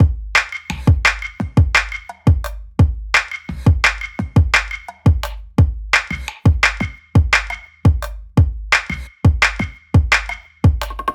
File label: 172 Shuffle Beat